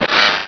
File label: cry